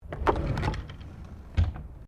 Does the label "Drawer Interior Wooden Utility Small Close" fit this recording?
Yes